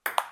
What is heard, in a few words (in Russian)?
ping pong podacha